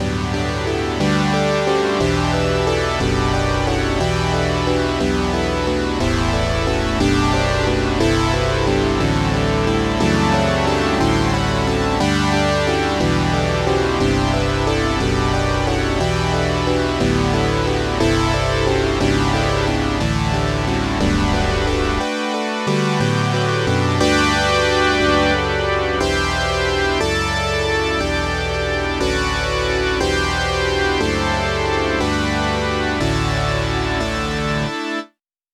fifth sawtooth wave

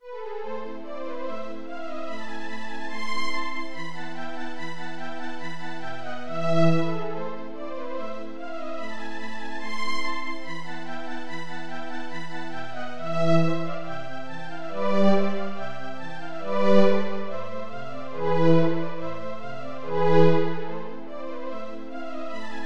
warm pad

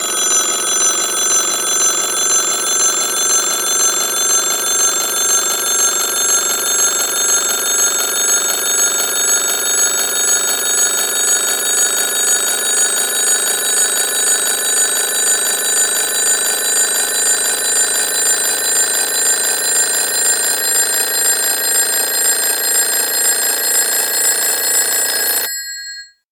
telephone; tonleiter

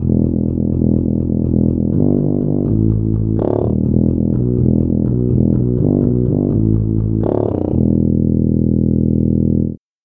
tuba bass

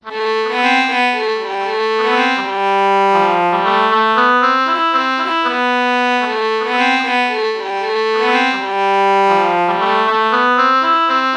oboe violin lpc false melody morph low

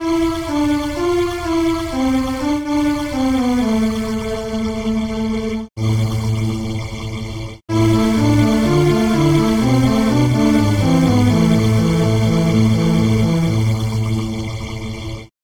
brightness

